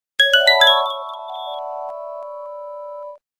Nokia Alerts; Windows Phone apps; Glint

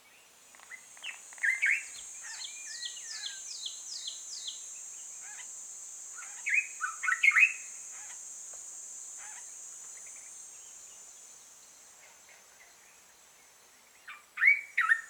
Zimbabwe hills at dawn; Google; Google Sounds; Sound Matters; alarms

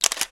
Shutter; KB620 Elbrus

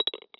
chess piece capture; game; Nokia N900

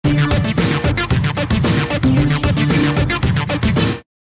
ringtone, electro; Google; NewWave Labs

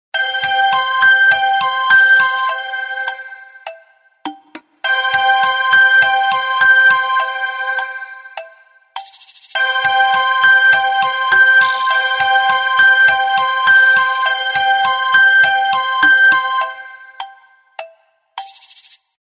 Garden Clock; Samsung SGH-A797 Flight

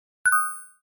closing sound; Nokia 6650 fold AT&T

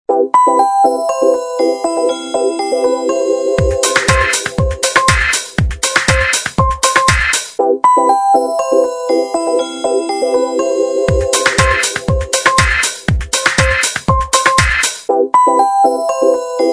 Tone Poems; Alcatel OneTouch Evolve OT-5020T

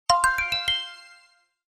Alert Steps; Samsung SGH-i617 BlackJack II

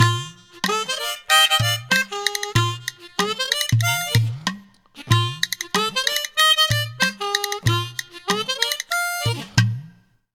Country Road, alarm; LG Smart Folder X100S

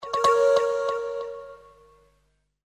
Message Alert; Sony Ericsson; P900

